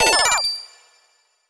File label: get item